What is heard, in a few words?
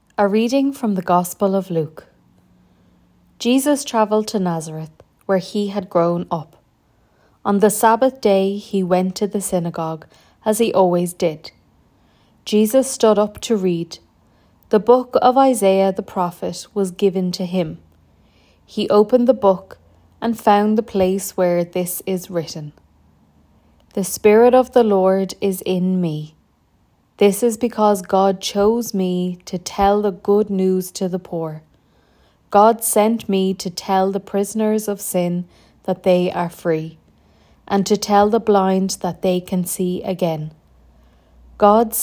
Gospel